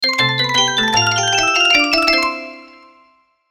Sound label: Logo Mallet Descend Melody; Blastwave